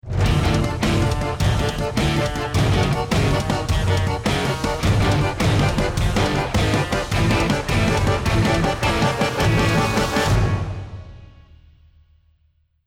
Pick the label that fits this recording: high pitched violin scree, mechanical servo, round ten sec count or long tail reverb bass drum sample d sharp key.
round ten sec count